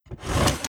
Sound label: Option Movement